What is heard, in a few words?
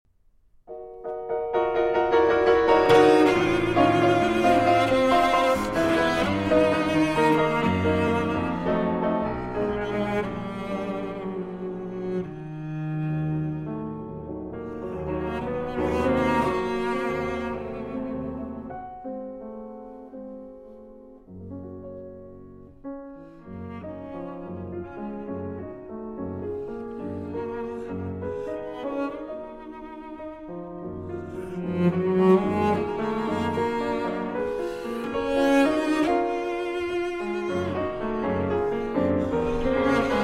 Romances For Cello and Piano; 14 Romances, Op. 34 No. 7, It Cannot Be!; Arr. Sheku Kanneh-Mason